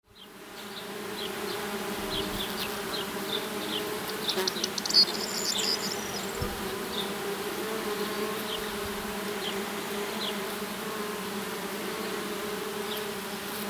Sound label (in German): phaselia bienen